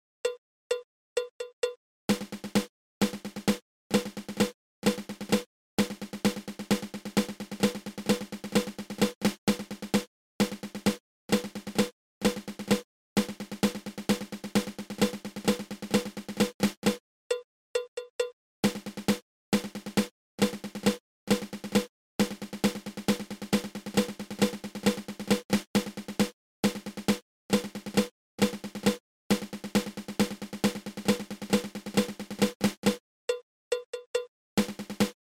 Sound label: Flam Paradiddle